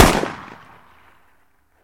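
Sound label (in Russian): протяжное эхо после выстрела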